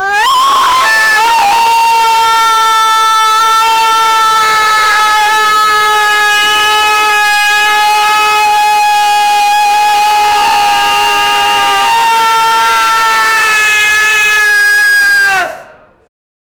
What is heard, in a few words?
long and emotion full gru, teenage; SFX Engine